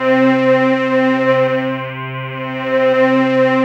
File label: SYN MORP, Roland; Sound & Vision - Gigapack II